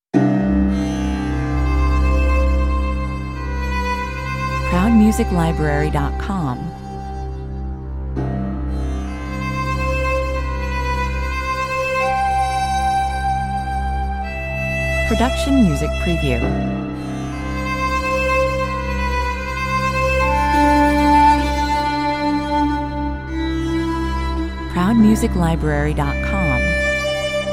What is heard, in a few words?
desert prayer 114 bpm